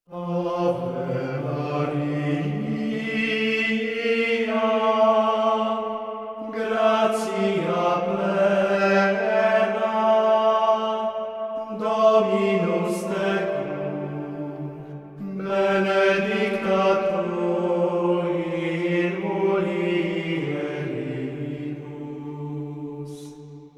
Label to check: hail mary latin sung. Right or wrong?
Right